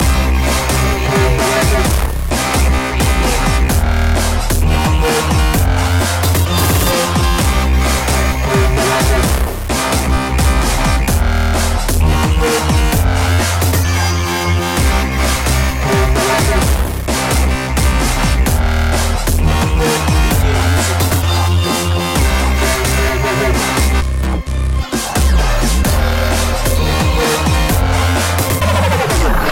destroyed loop

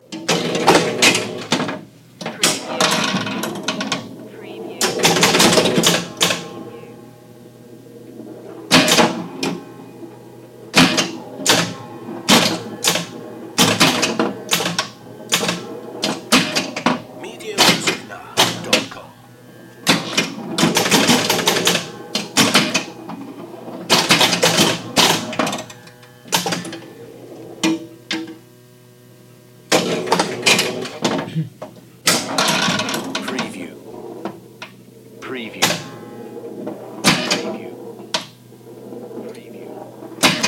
Playing Pinball

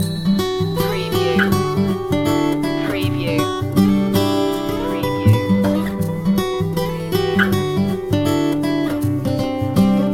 loop

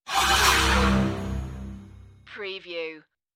Dark Production Whoosh

